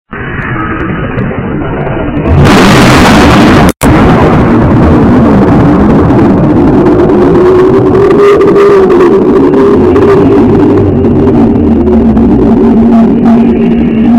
krakatoa eruption

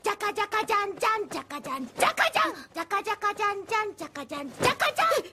air guitar

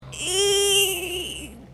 grossed out eeee

